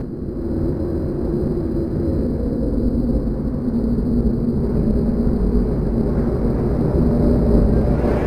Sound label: noise ramp, Drum N Bass; Sonic Foundry (Sony Creative Software); Knutrix Drum & Bass